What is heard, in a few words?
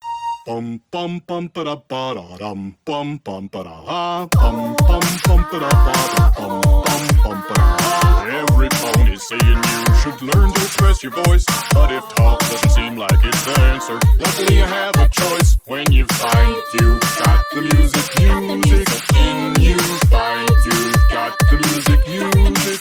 Electro Remix; Pony Music Archive